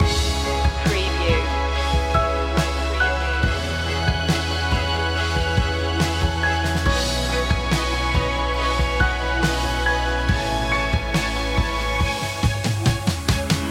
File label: epic strings loop